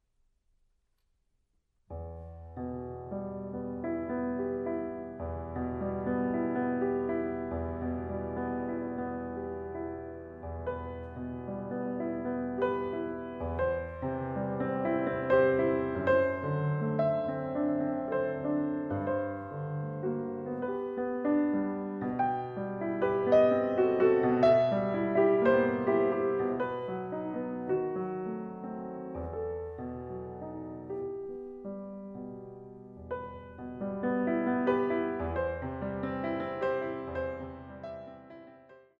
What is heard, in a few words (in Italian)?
Suleika (versione per pianoforte)